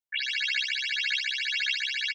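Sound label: Alarm Beep Pulses Short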